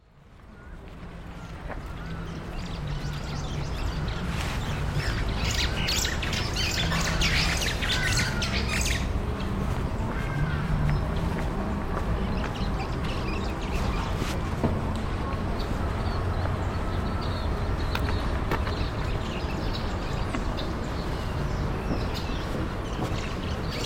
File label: birds